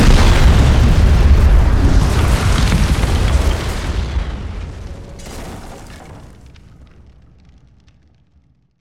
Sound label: explosion bomb; Explosions; PremiumBeat